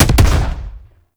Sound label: Grenade Launcher Punch; Weapons; Scaldra